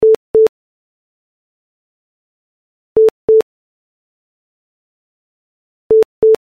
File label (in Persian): entezar, بوق تلفن; انواع بوق تلفن و معنای آن